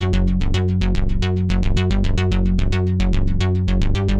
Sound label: Ambiental Two